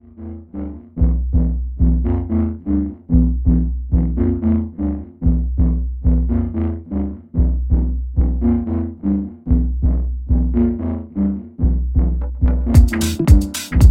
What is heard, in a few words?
instrumental